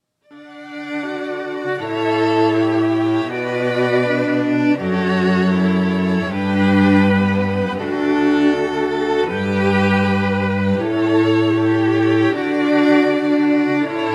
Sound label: String Quartet